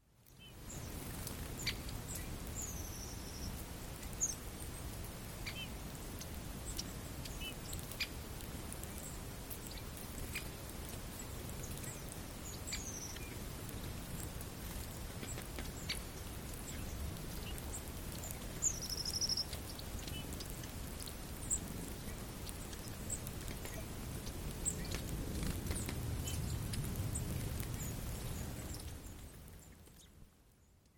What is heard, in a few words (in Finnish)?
käpytikka; linnut